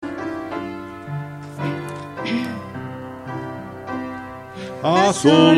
A solas en Getsemani melodia palabras

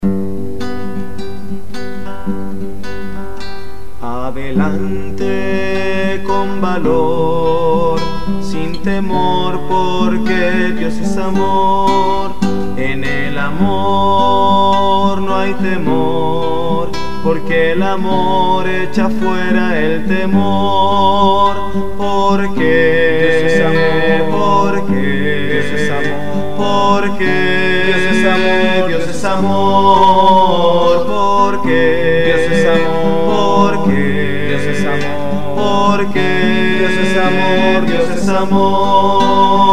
Adelante con valor melodia palabras

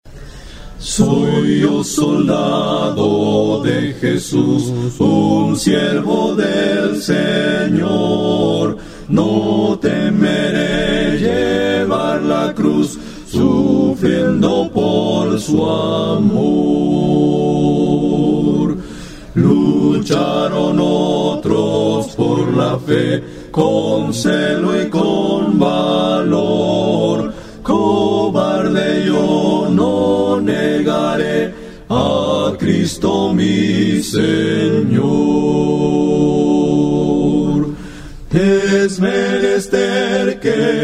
Soy yo soldado de Jesus melodia palabras

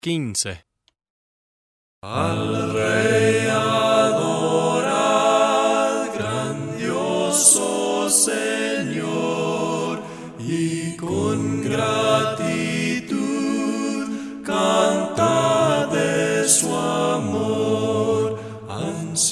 Al Rey adorad Palabras cantadas sin instumentos

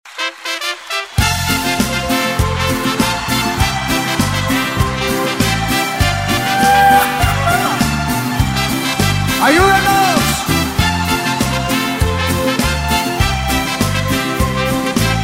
vocal

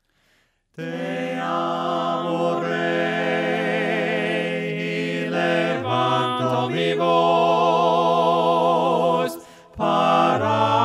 melodia palabras

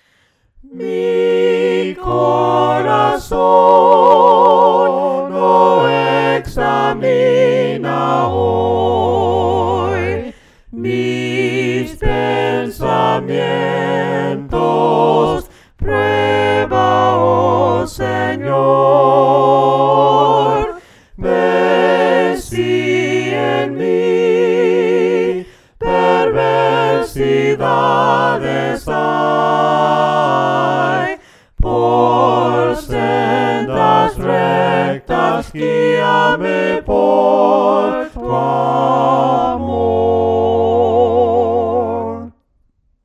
Mi corazon oh examina hoy melodia palabras

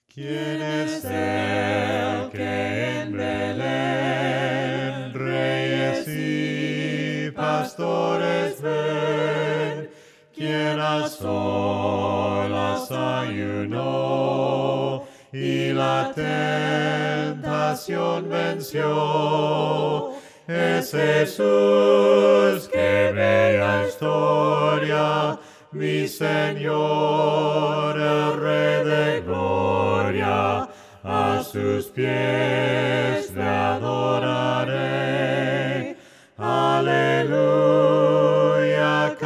melodia palabras sin instrumentos; Es Jesus que bella historia

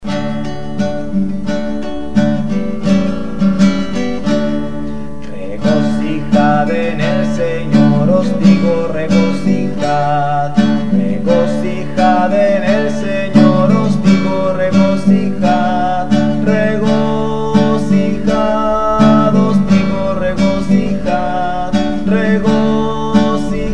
melodia palabras; Regocijad

